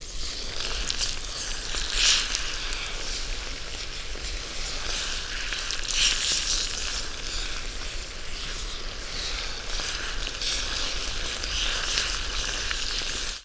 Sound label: brething magma